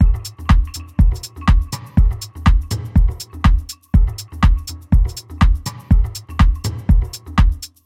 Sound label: house techno beat passage 122bpm em 122; drum loops